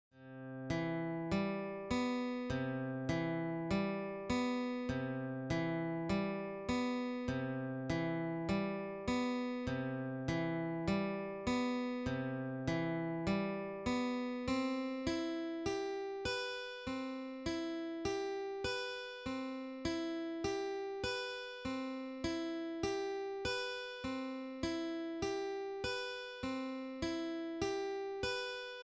arpeggios practice, guitar

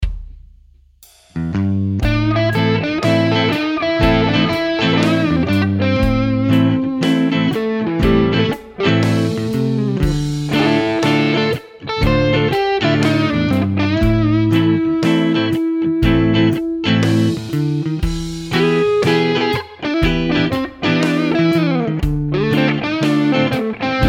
Lick blues A minor 120 BPM, guitar

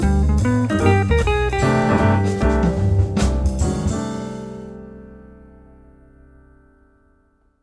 jazz ending

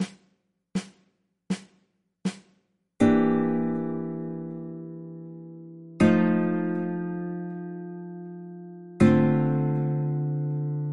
chord progressions iii7b5-IV7-ii-V-I

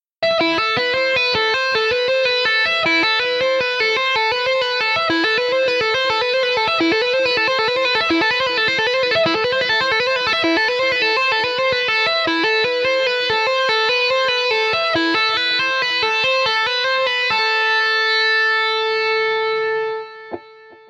Speed up Tapping

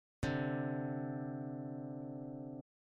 intervals harmonic major second, guitar

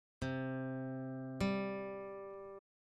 interval perfect fifth, guitar

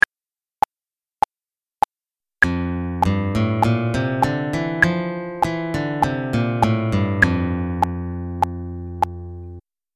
f major scale

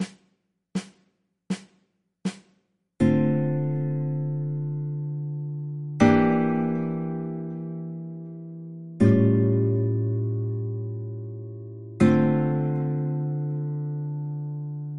chord progressions i-ii-Vaug7-i

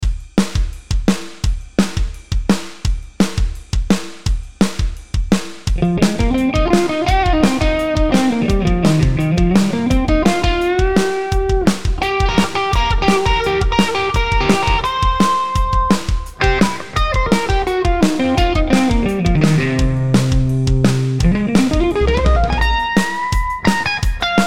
Blues Rock Solo, guitar

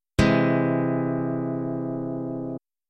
c augmented; chord contruction; guitar

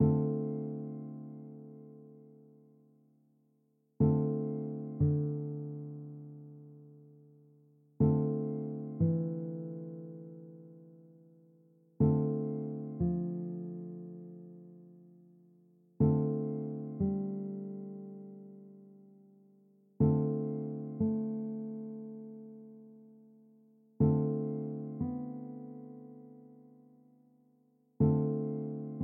d dorian vamp; modes

